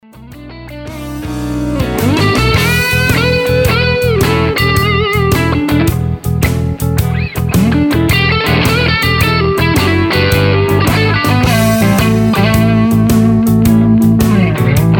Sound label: Blues Tonelab st; gitar